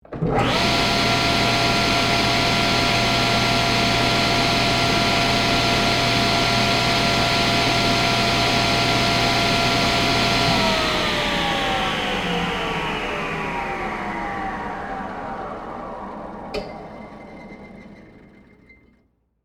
Table Saw Run, Industry, Table, Saw, On, Run, Off; Motors; BlastWave

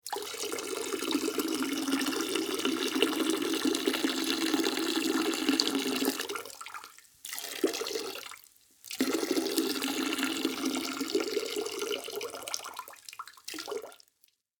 Human Male Pee Toilet Urinate; Urinating (Sound Effects:Human)

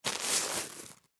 Foley, Plastic, Bag, Rocks, Pick, Up; Pebbles; Rock Sound Effects

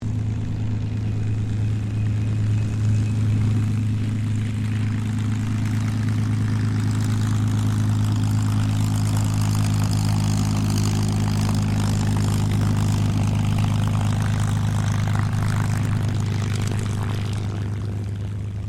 AIRCRAFT CA-18 MUSTANG TAXI; P51 /CAC-18 Mustang; Airplanes; Vehicles

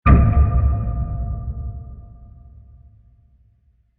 Deep Scary Impact; Blasts; Impacts; Crafted Signals

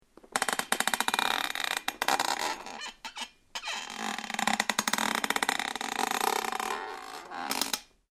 METAL CREAK; Materials

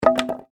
Impact Wood Drop 2X4; Miscellaneous Impact

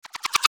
Mouseover Reverse Click Ascend; Multimedia; Blastwave

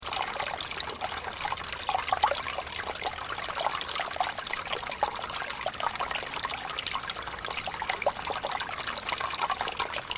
Water Stream Gentle Temple Garden Japan Loop; Elemental weather effects; Nature

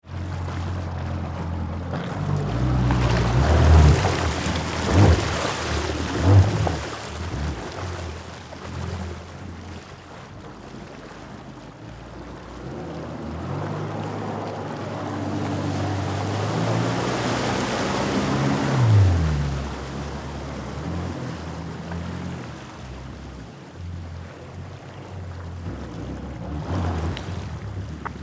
Vehicle Jet Ski Rev Engine Multiple Shallow Water; Boats

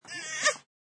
Bby Qk Cry, Quick cry from a baby; Crying

